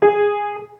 low G sharp

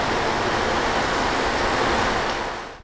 Shower Water

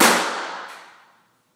Basement Womens Bathroom; Porter

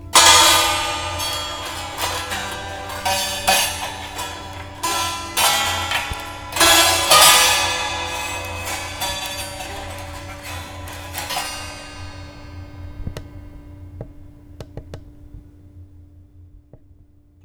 Santoor

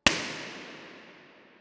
IR

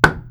underwater

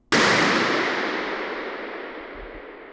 racquetball court

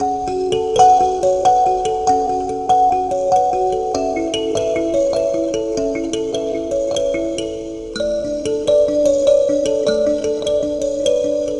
kalimba sample

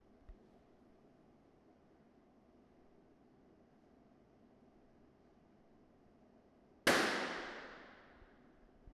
west wing

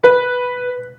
low B

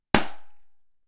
IR Room

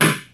balloon; snapchat

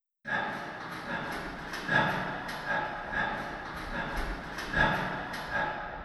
Running in Baker

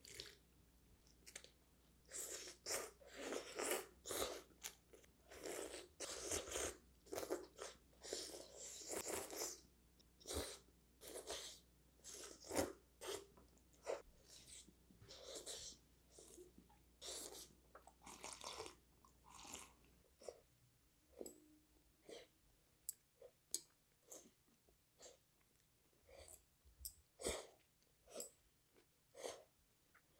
slurps

